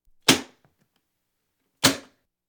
Household Phones

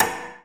knock echo short; Cinematic Metal Impacts

